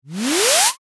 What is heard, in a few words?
short riser